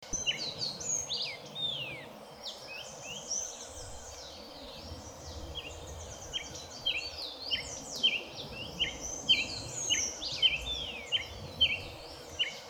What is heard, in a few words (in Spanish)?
pioro, Pyrrhocoma ruficeps, Chestnut-headed Tanager